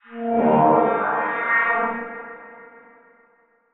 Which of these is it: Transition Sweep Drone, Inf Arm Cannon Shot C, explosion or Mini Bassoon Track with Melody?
Transition Sweep Drone